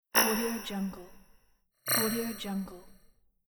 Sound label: user interface sfx operation rejected